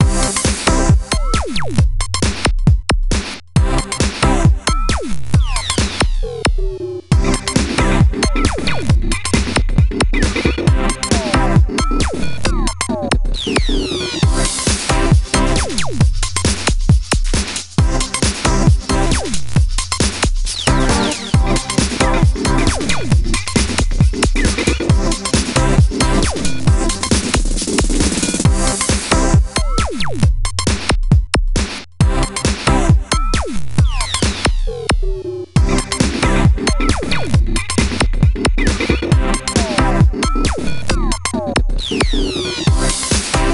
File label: No Vocals